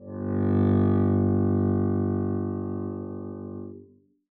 arco g1 f down, double bass; dsmolken

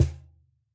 Cajon hit mp; Idiophones; Struck Idiophones

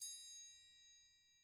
triangle hit mp; Idiophones; Struck Idiophones; Triangles

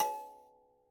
Mbira A4, Kalimba, Kenya; Plucked Idiophones

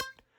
Hohner Special20 Normal release C4, Harmonica; Aerophones; Free Aerophones

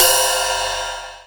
ride, LM-2; drum machines

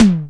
tom, LM-2, drum machines

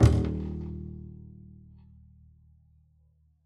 pizz eb1, double bass; dsmolken

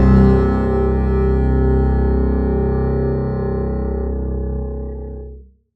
super c ffp, double bass arco; dsmolken

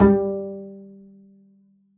pizz g3, double bass; dsmolken

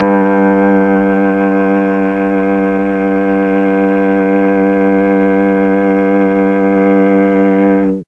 G1 viola, mellotron

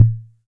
lowtom, Yamaha MR10, drum machines

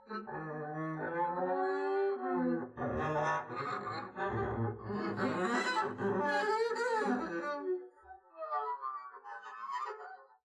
arco noise high moaning long, double bass; dsmolken; noises

